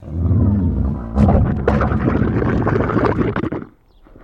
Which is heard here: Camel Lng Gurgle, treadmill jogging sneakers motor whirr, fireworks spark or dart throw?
Camel Lng Gurgle